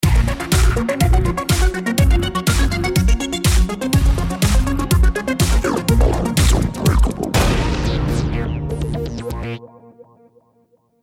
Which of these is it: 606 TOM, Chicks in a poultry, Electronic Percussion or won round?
won round